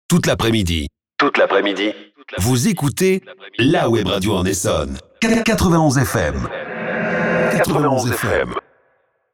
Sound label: Drop Jingle